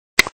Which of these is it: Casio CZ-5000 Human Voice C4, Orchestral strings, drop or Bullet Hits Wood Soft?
drop